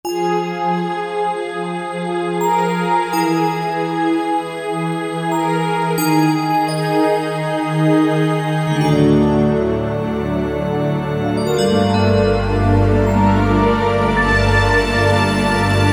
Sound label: Bell Pad, synth